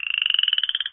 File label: frog; Source Engine; cstrike